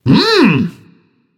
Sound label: notice; smite; Vulcan